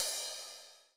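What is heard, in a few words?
Combo Crash; Alesis SR16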